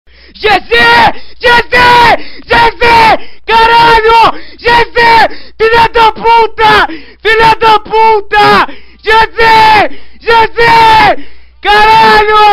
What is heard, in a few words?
gzzzzz